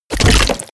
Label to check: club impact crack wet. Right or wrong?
Right